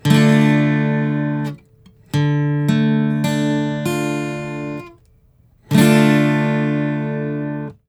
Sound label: Major or Minor